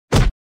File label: Kick Body Hit With Thud; Fights Bodyfalls